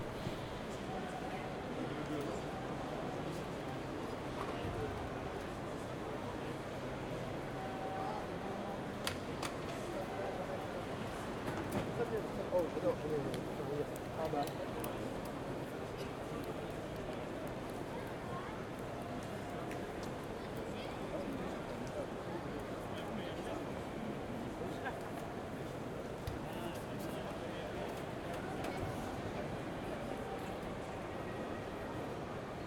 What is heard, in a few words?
hall ambiance people far, CROWD AMBIANCE; Chupapsound